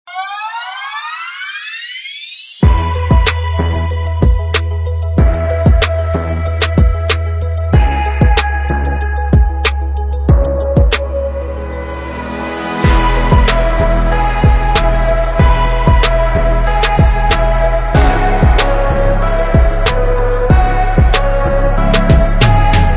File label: Bass Pop EDM; Big Fish Audio; Construction Kits